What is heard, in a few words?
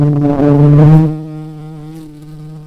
Lapidarius queens, bumblebees